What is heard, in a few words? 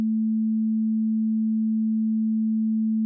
sine 220; timbre